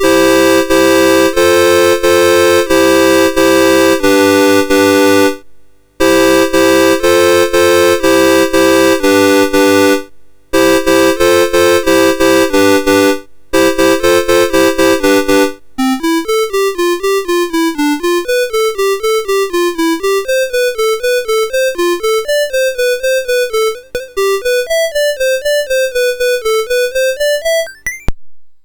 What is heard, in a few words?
Chord, MOS6581R3